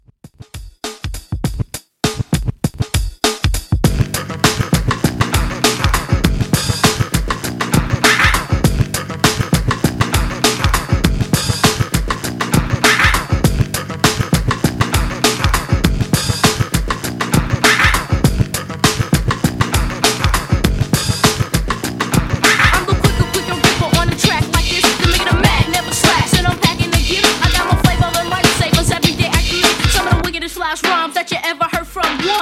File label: Old School Redrum 100 Bpm Dirty